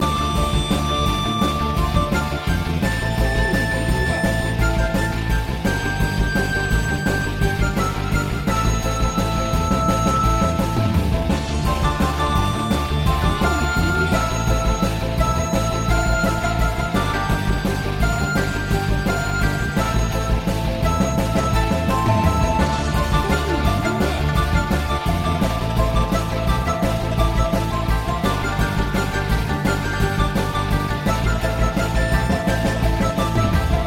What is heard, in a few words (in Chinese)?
BOSS战斗场景; 空战西游; 《空战西游》是一款横版飞行射击页游，玩家在游戏中扮演各种角色来拯救即将毁灭的西游世界。游戏由深圳市比格互动科技有限公司制作发行。